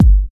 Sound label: Bassdrum Clean